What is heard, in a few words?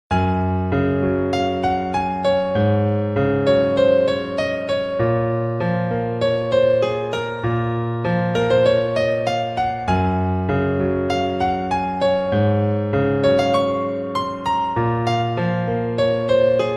beat